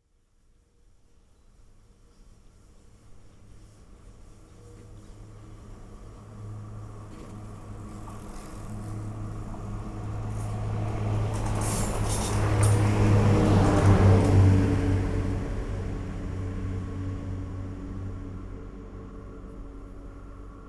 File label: Train short DPA